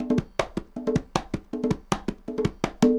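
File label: conga bongos, latin, 80bpm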